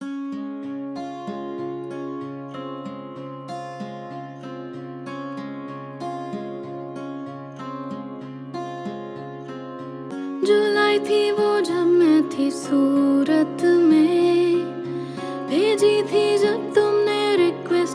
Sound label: Ring Ceremony Song; wedding